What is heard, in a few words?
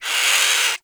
Avichaea Tranq Dart Grunt; Hunting Critters